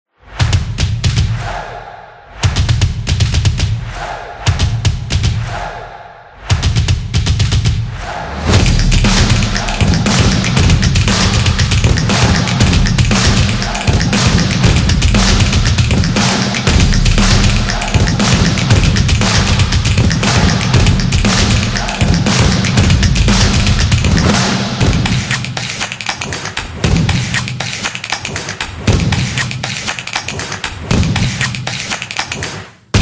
Snaps and Claps Percussion

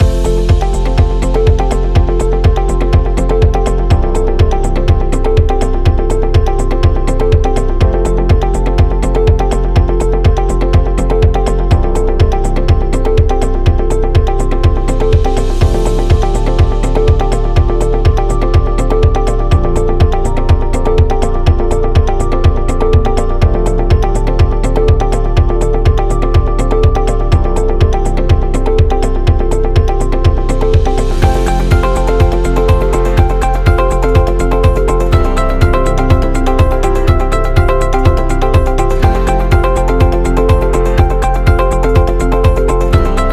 Upbeat Corporate Loop

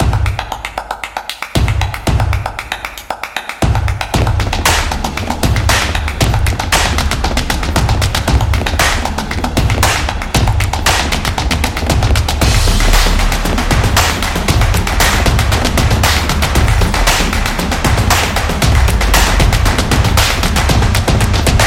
Upbeat Drums Action

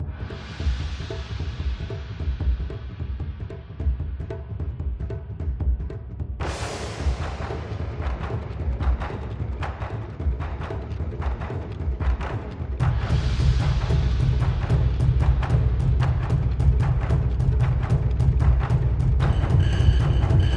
Chinese Culture

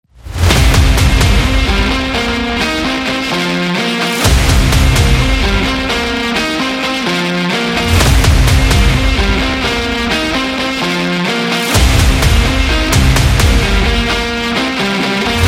Sport Rock

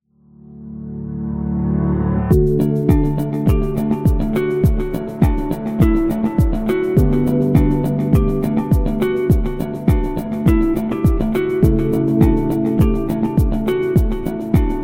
Positive Message, corporate music; corporate music vol 9

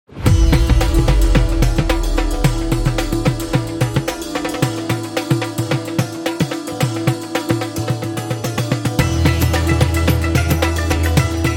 Indian Rock

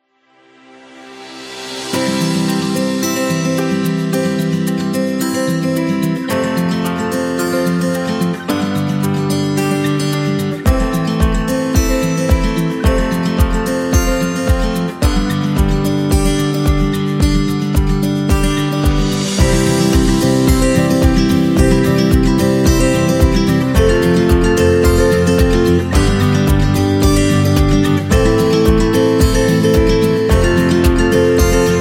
Calm Corporate; business background

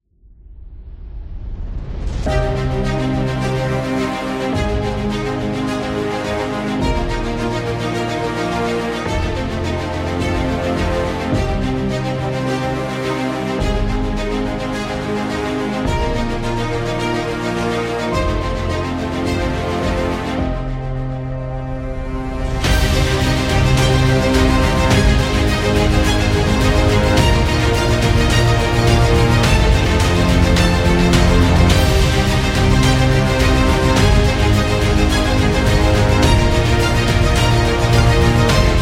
Be Epic, dramatic

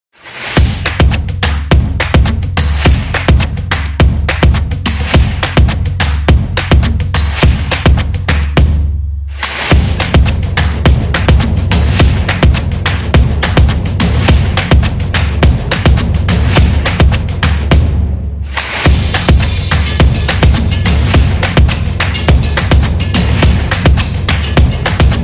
Dance Beat; stomp and clap

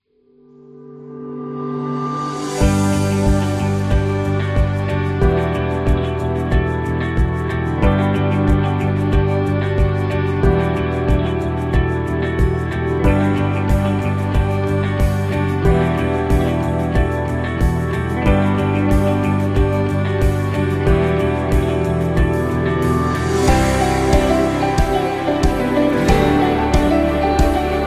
Inspirational; corporate music vol 10

